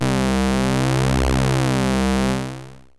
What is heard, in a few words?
softsync a, phaseshaping